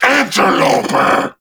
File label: Vocal Attack; Warframe; Duviri; Dax